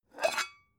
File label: pan open short